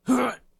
Male Hurt

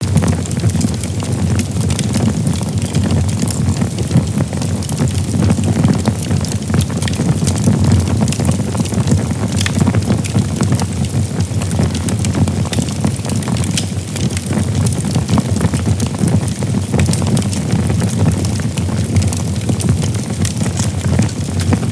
Wood Fire Burning Loopable

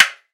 armor

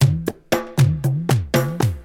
Tribal Beat

